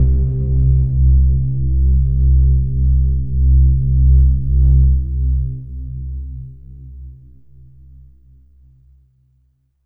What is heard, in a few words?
Overlay Drone; Act1 The View; Mirror's Edge Catalyst